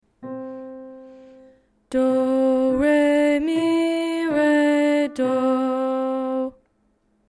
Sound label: Centering Song; Level 1